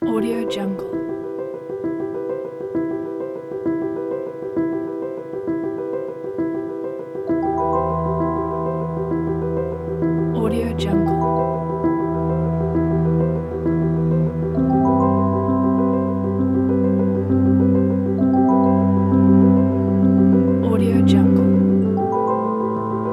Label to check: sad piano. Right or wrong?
Right